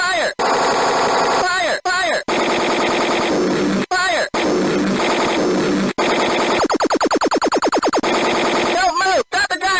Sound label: fire fire gunshot